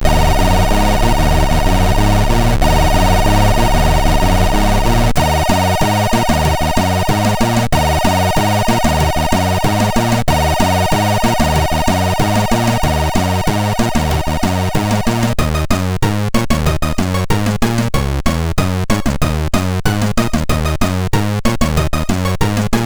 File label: Disco, MOS6581R4